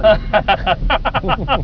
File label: chuckle